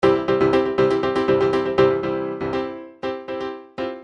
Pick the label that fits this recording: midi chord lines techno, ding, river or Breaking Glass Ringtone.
midi chord lines techno